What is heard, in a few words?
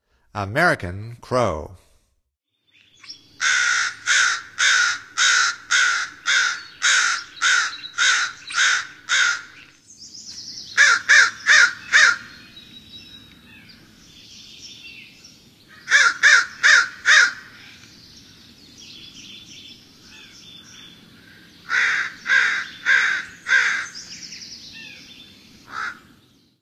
American Crow, Songs of Birds of North America; Stokes